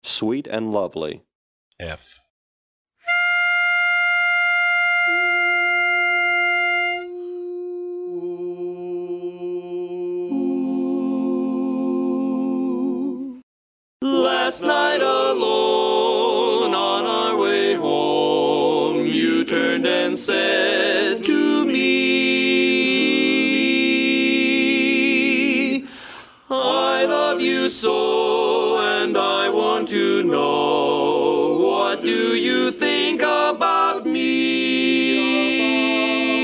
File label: Lead, barbershop quartet